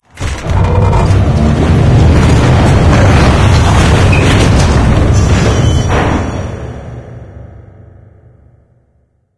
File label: sled; ambient; source engine; ep2; outland